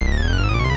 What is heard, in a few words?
fall; spoonman; dota; crownfall